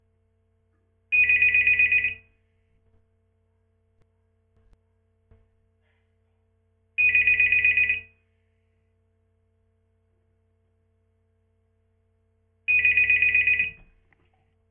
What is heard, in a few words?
beeper; FunkyFon; ringtones